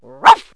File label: one bark; animals; Wired for Sound Pro CD; Aristosoft, Inc.